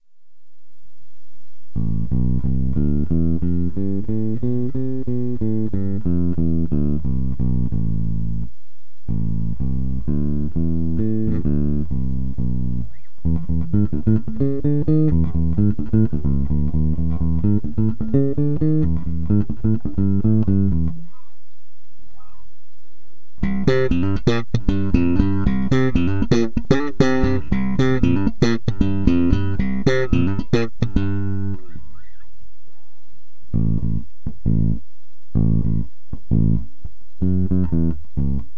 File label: rotosound roundwound